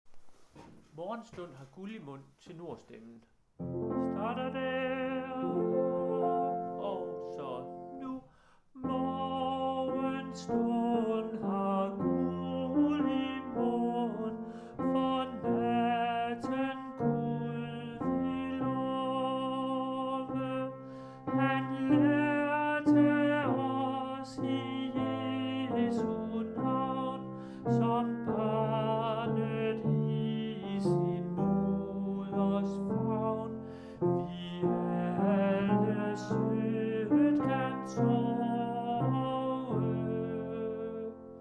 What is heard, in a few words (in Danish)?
tenor; Morgenstund har guld i mund